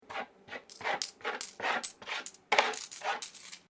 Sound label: Coins Counted Wooden Surface; Common coins; Money; Objects